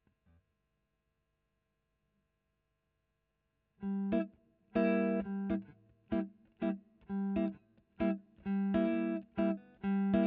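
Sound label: Electric guitar Royer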